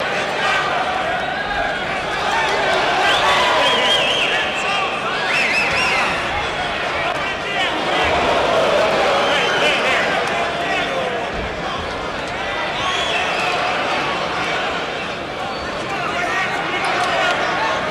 Crowd React Fighting, boxing

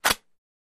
Metal Tear Clink, small impacts

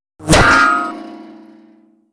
Bullet with Whir Impa, metal

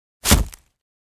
Foliage Impact Object Dropped on Ground

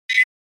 Flash Beep Dbl HF Elec

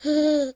Baby: Happy Noises And Coos

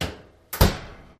Oven Door Close; appliances

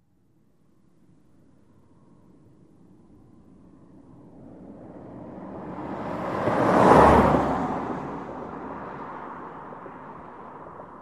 Sedan By R To L 55mph

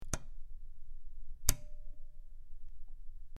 Button Press In Out, switch clicks

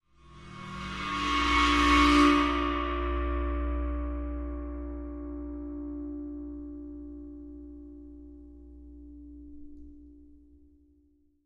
China Cymbal Bow, cymbal & gong; China 16 Cymbol Bowed 4

